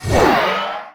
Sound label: Pickup Magic Speed; Pickups